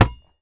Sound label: Street Ball Rebound Pull; SFX Kit